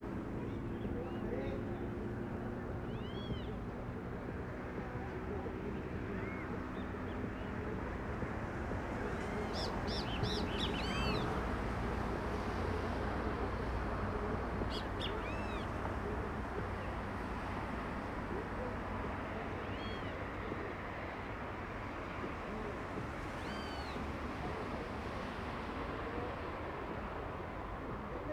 Transito com musica e bem-te-vi

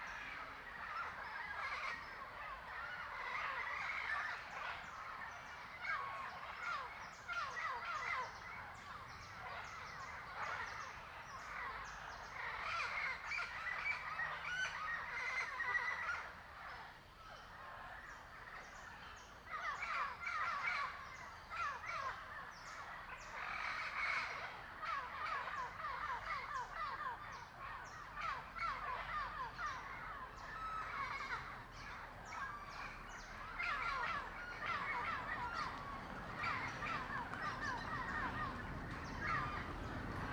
Muitas aves no fim de tarde; muitos passaros; colecao sonora do cerrado

